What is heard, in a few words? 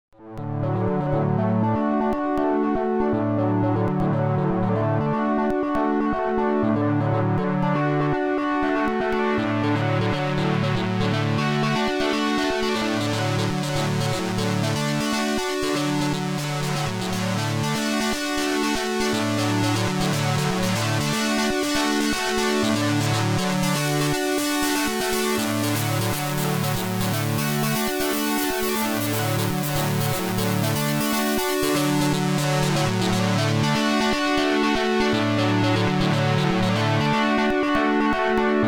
Preenfm3 FM Synth Wrap amp and wrap